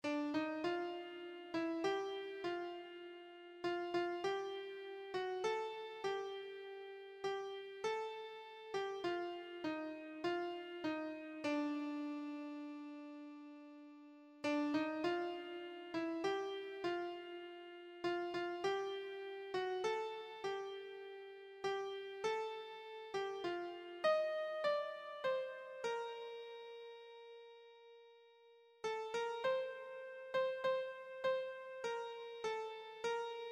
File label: rugged cross, keyboard